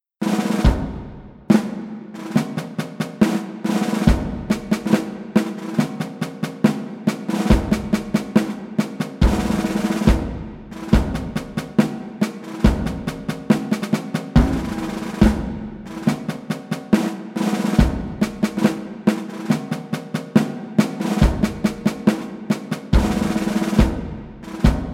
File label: Martial Drum; Noisebiscuits